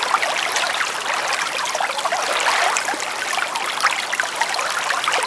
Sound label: the water of a brook